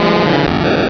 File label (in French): Cri